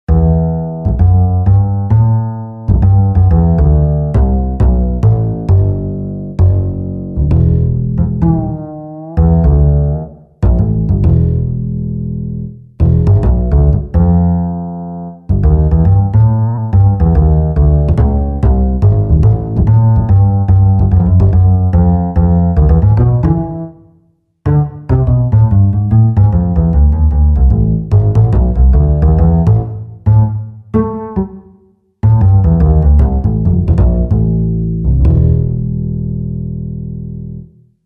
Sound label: Contrabbasso; Roland SRX-07 Ultimate Keys